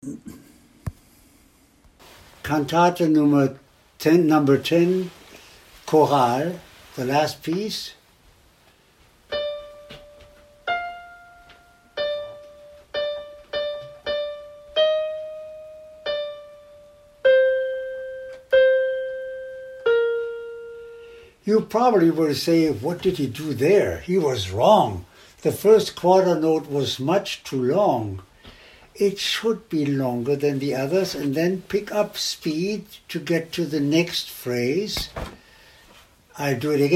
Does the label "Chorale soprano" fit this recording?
Yes